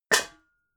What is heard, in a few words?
pots hit hard short